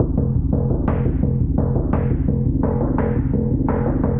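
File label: Tense Pulse G minor 114 bpm, Atmospheric; MIDI Clips; Tonal; Ableton; Factory Packs; Mood Reel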